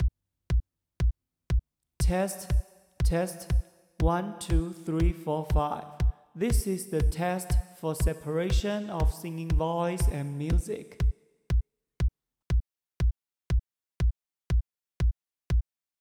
drum count

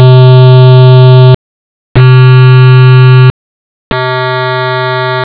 sine output

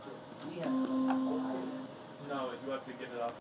organ

